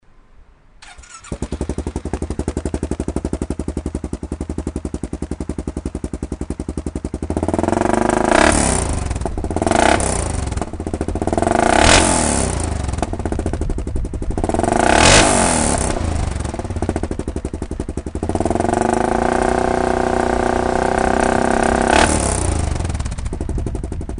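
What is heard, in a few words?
sportsman 570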